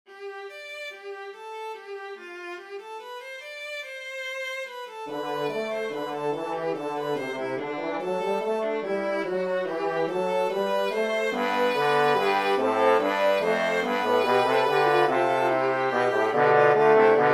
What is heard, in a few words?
Mixolydian fugue